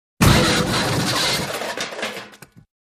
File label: Crash Metal Shatter